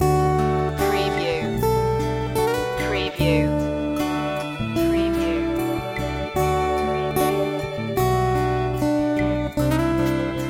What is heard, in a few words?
beautiful paradise loop